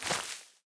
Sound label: grass destroy